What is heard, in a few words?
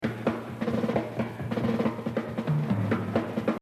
Exercise, jazz drumming